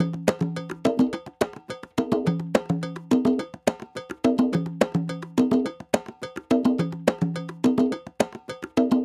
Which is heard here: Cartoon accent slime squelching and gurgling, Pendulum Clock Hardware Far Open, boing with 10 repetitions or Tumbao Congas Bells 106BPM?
Tumbao Congas Bells 106BPM